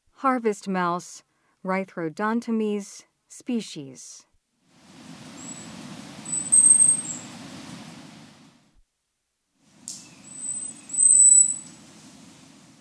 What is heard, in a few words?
Harvest Mouse Captive; S. America